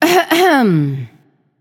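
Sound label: clear throat, female; smite; voicepack; hera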